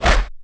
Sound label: attack